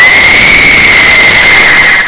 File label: happy joy